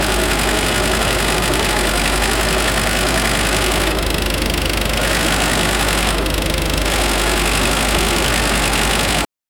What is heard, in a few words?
scooter wheel engine; sound effect